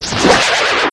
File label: skulltula die, enemies; zelda